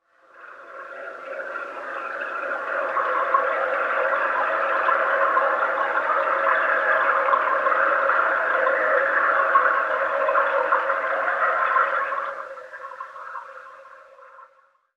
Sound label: trickle; Chalice Well